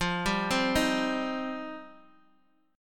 F 7th Suspended 2nd F down Guitar Open D

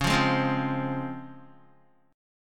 C sharp Major 7th C sharp Guitar; C#M7 strummed; JGuitar

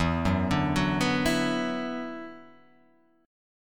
E 7th Suspended 2nd E down, guitar

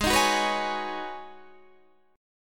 G sharp Minor 11th G sharp Guitar DADGAD, chord

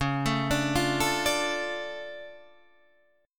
D Minor Db, Dm/Db chord, guitar; Dm/Db arpeggiated; JGuitar

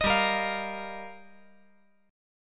G Minor 7th Sharp 5th

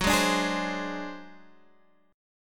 A flat 7th Flat 5th G flat, chord

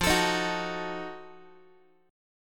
F 7th Flat 9th Guitar, chord; strummed; F7b9; x,13,12,13,10,x; JGuitar

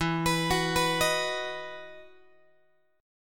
E Major 7th Suspended 2nd, Guitar

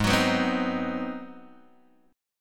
G sharp 7th Sharp 9th, Guitar, Open G

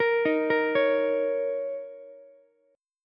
Bb Minor Double Flat 5th down Ukulele, chord; Bbmbb5; arpeggiated; JGuitar

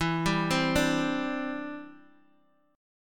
E Minor 7th Sharp 5th, down, Guitar, Open D

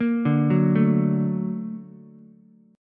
Bb Augmented Major 7th Bb down Ukulele; Bb+M7 arpeggiated; JGuitar

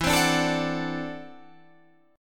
D 9th E, guitar; D9/E; strummed

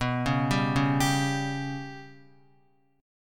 B Suspended 2nd Sharp 5th down Guitar; Bsus2#5; arpeggiated; JGuitar

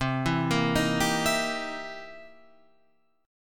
C 7th Sharp 9th, C, down, Guitar, Open F; C7#9; arpeggiated

